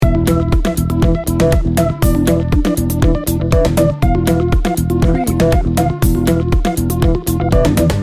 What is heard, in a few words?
upbeat electro-pop loop